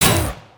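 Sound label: Attack Spark Effect; Dragon In A Box; destructibles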